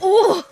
polka

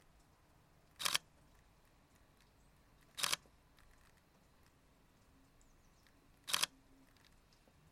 sony a7riv shutter

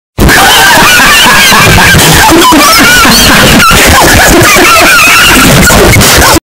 laugh goblin

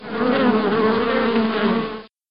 bees swarming; BEES SWARMING . W … E LOOP

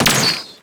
shot muzzule; splatoon 2

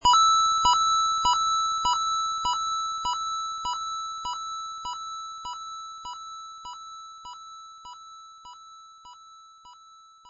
coin; smb

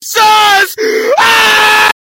aaaaaaaaaaa

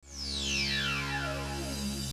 intro sound

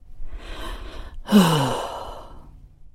sigh; soundbible

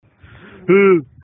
ahh

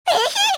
eep

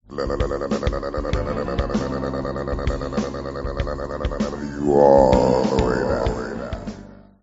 lalalala

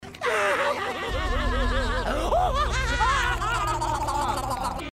laughing; spongbob and patrick

